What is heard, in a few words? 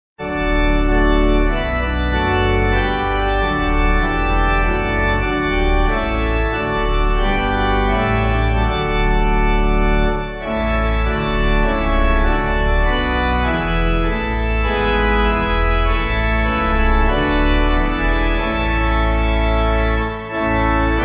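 Pipe Organ, Music Backing Files for Churches; Spirit Of Faith; Bach; All things are possible to him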